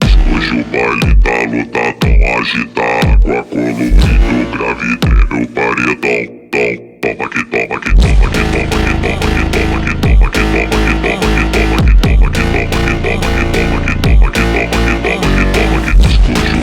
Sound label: slowed brazilian phonk